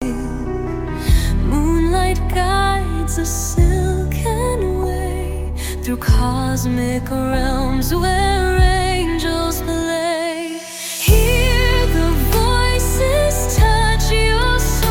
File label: angelic harmony uplifting spiritual music